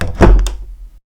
door close

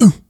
cyclops grunt

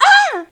female death; ufoai; soldiers

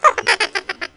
imp laugh

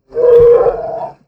bear

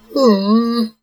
pout

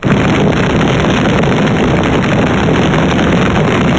fire

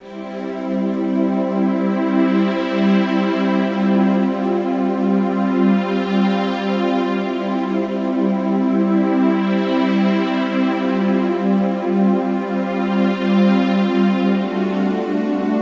Win Game, music; Crystan; ufoai

